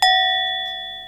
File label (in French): cloche; l'enregistrement du son d'une cloche à vache; Ci-contre l'enregistrement du son d'une cloche à vache; ANALYSES ET RESYNTHÈSES